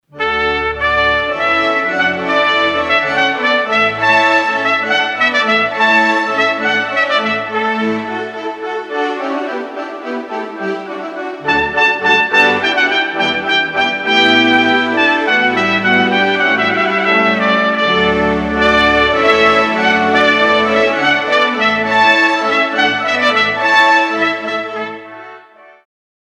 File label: Hornpipe